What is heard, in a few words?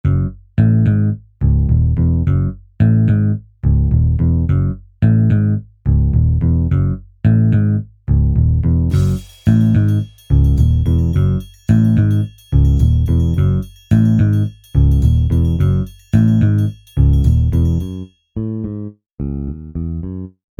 soundtrack; Online Weight Calculator